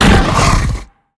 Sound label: chimera pain; mutants; STALKER; customnpcs